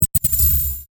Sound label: Electric Discharge, Magnetic, Science Fiction; Stutter; Sci Fi Sound Effects